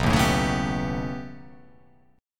B Augmented 9th B, chord

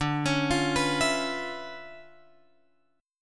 D Minor Major 13th

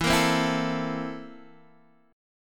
C 13th E

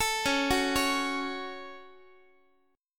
Db Suspended 4th Sharp 5th, Guitar chord; arpeggiated; JGuitar

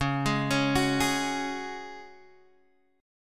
C sharp Suspended 4th, Guitar, Drop D

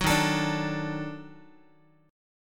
D sharp Minor Major 7th Flat 5th D sharp Guitar; strummed

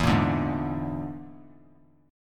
E 7th Flat 5th, down; E7b5; strummed

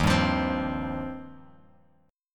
Eb 6th Add 9th, Bass Standard; Eb6add9 strummed; 11,10,15,17; JGuitar

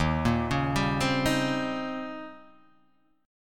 Eb 13th, Guitar, Half Step Down

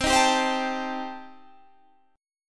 D sharp 6th Flat 5th, C

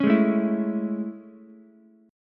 A Major 7th A, Ukulele; AM7 strummed; JGuitar

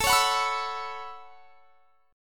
A 6th Add 9th, guitar; A6add9 strummed; x,24,23,23,24,24

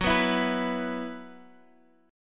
B Suspended 4th, Gb, chord

